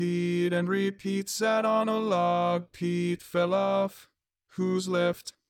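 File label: Baritone, barbershop tags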